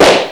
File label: luger; WAR